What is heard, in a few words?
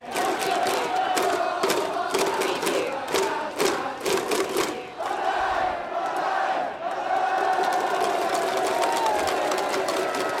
Hiroshima baseball crowd chant Japan; Hiroshima Carp